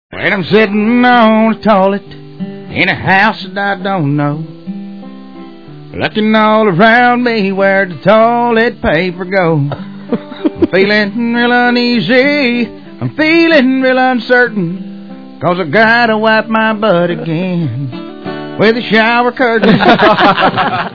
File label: toilet paper